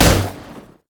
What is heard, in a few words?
armsmaster empower cleave; LoL; jax